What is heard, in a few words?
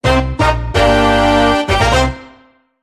fanfare